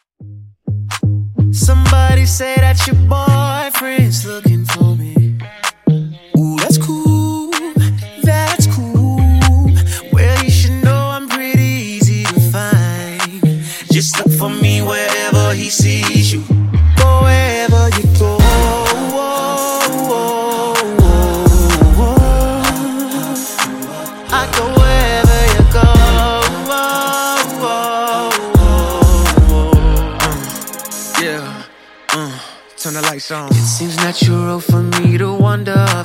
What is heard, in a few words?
Funk Intro Outro 127Bpm